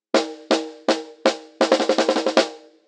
High pass 320Hz Low pass 8kHz steep; Sugar

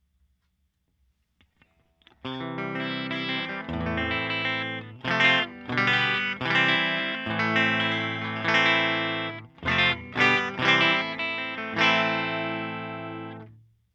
Fender Twin Reverb jaguar clean

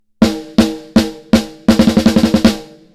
snare; smart comp

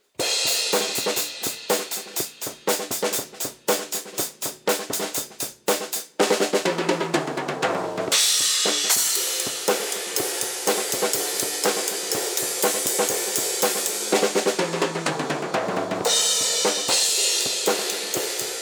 HPF 300Hz; AMEK 9099

